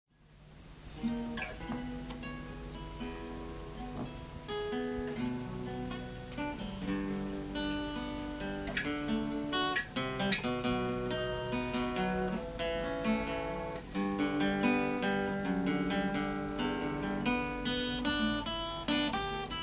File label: Instr ac gtr